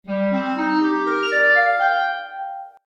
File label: screech, clarinet